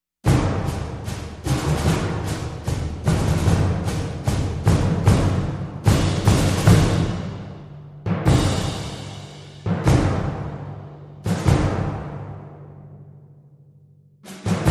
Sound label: Percussion Only